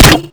m203, weapons; Modern Warfare

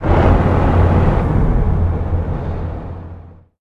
t90a engine int load, engines tanks; War Thunder

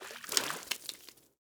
fish caught; Rust

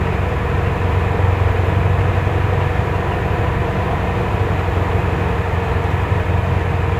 Ships Speedboat; DCS

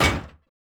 metal door deploy; Rust

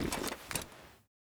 salvaged hammer deploy; Rust

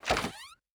door small wood open start; Rust

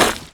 grunt gravel run

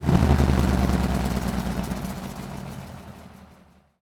engines; World of Tanks

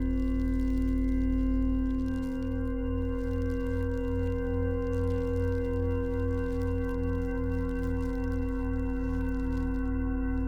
crystal ambience; Rust

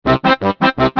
wrong

